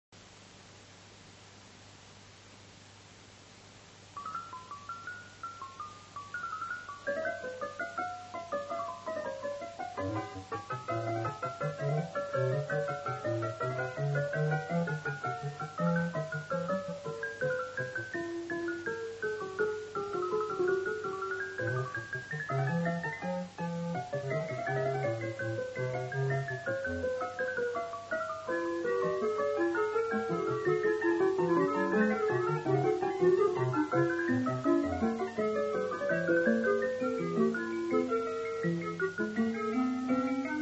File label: Fugue